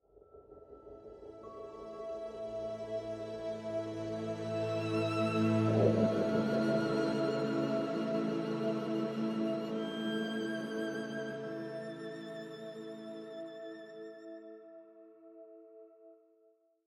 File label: 85bpm; Imperium High